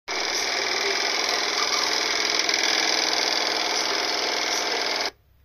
The rattling sound of the washing machine when spinning